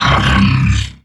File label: dry, strider, combat, movement grunt; Half Life Alyx